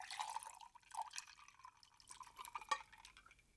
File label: Liquid Pour